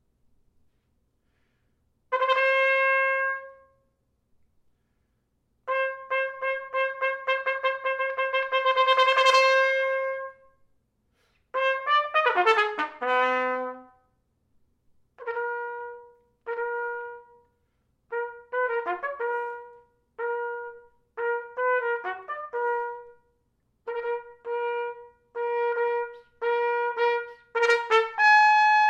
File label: Fanfare